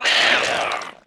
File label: SHELLFISH Die; Eft